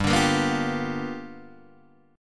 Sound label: G 7th Sharp 9th G chord, Guitar Open D